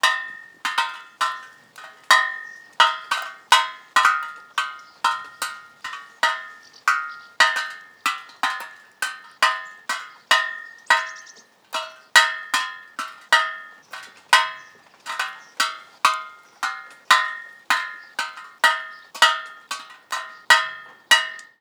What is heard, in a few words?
RAINDROPS ON METAL; The Soundcatcher; 365 Various Sfx